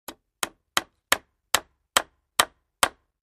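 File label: 2x4 Hammer Nail On Cement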